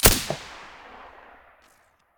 vss silencer stereo, weapons

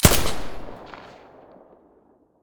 silencer ump45 stereo, weapons

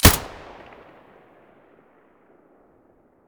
aps muzzle stereo; STALKER; IWP

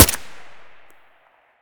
ak74u silencer mono; STALKER; IWP

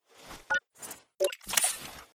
medkit exo use; STALKER; IWP; item usage

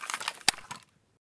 bizon firemode, weapons

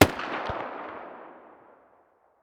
muzzle spas12 mono far

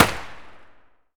muzzle fn2000 far

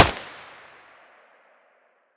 mp5 muzzle mono distant, weapons

